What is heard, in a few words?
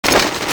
at4 clip in, weapons; csco; csgo